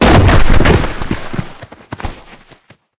sandbag crash

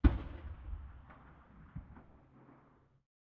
ambient shot stereo

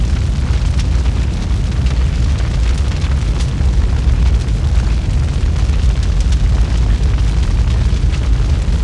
Fire, Damage; DCS

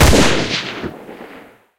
mgff last shot, guns

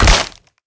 fall damage; Rust

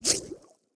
bullet flyby underwater; Rust

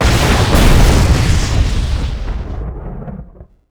armada engine explode

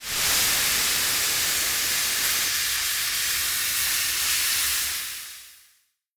steam pipe burst; Explosions; Call of Duty Modern Warfare

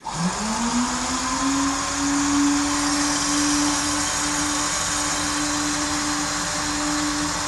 Aircrafts P-51D Actuator Coolant; DCS

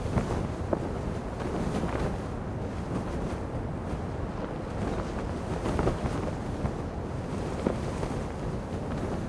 crew on chute airmass, personnel; DCS

